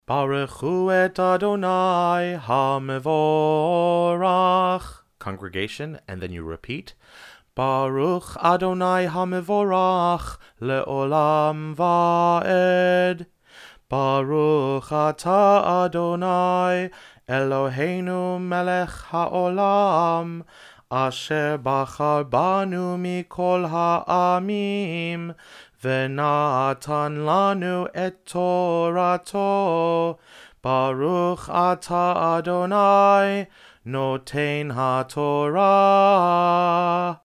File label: Blessing Before Torah reading; NLS BM style higher